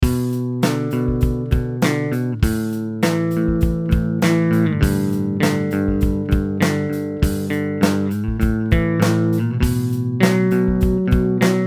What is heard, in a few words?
Tbird Picking Neck + Bridge